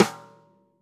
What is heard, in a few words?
stickshot - snares on; JazzFunkKit